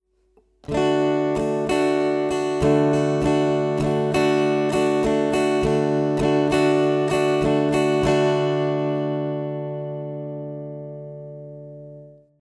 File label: D Chord Strum Example, guitar